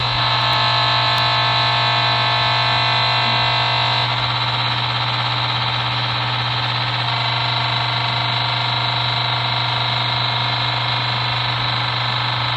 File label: Spikes